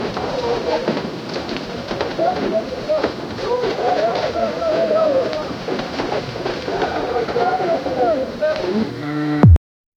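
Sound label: loopable old west town ambience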